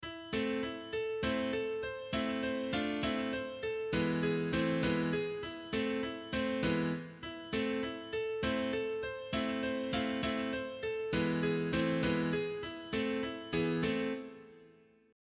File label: Cadence